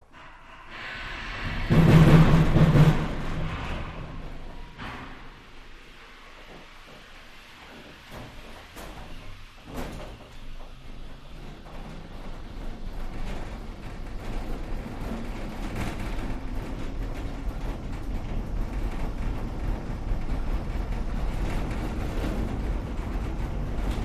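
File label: Mountain Cable Car Int, cable cars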